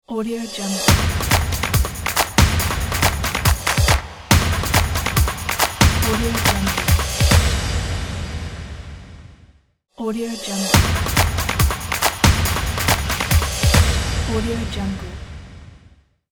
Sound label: the sport logo